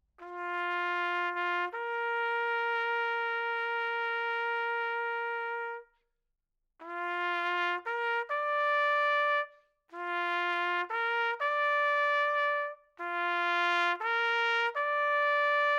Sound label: Trumpet Taps